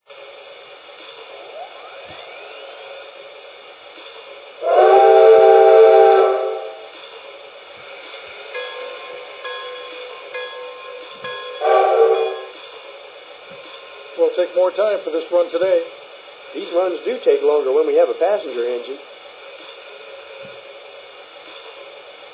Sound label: t1, trains